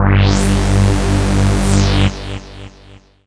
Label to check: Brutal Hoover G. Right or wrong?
Right